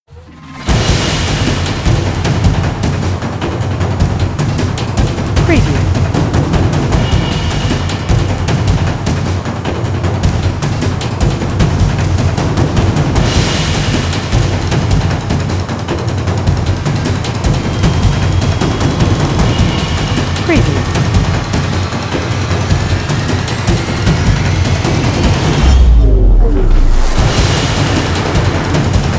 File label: Cinematic Drum Hits Chasing Running Fighting Film Soundtrack